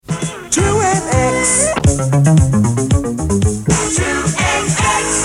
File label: radio jingles